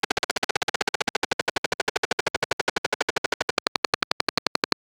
clean gear clicking sound; cleaning

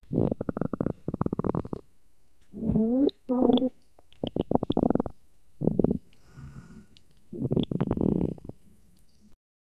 wet stomach gurgling soun; human body

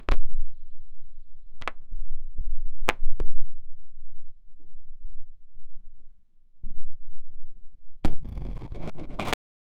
open mic, stage

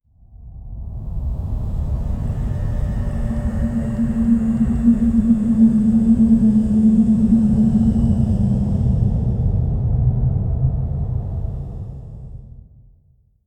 the terrible breath of a, beast

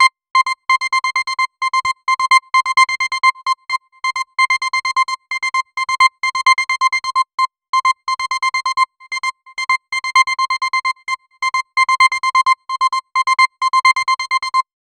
energetic logo sound viva, branding

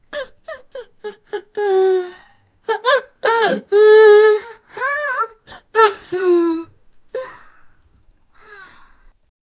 funny crying, tearful

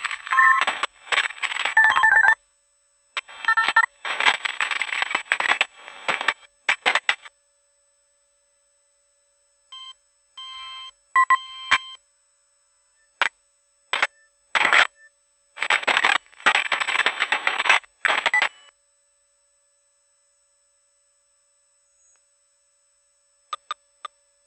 sounds of incomming messa, social media

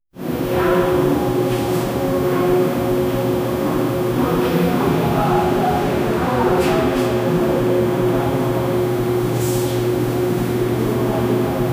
hospital isu cpu sound, buzzer

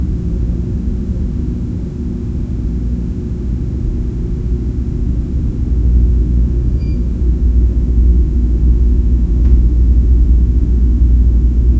subtle ambient noise, breeze

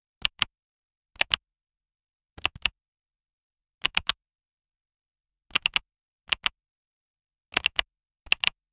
single keypad press

